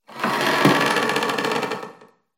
sanctuary wood; Half Life Alyx

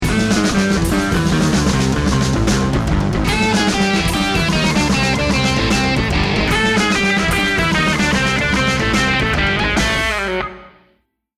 round ten sec count, music

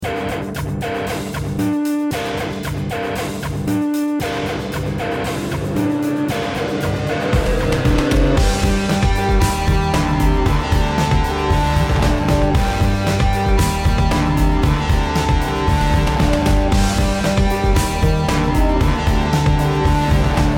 bomb planted

